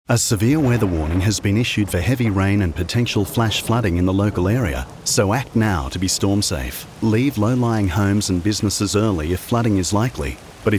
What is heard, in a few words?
heavy rain flash flooding